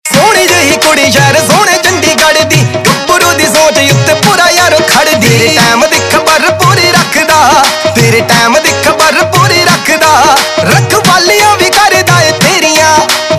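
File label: Ringtone, Punjabi Pop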